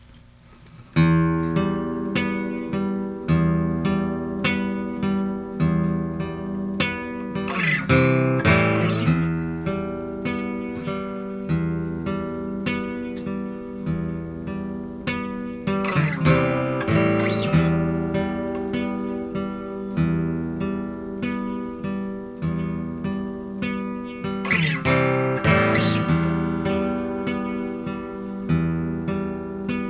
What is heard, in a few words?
e minor fade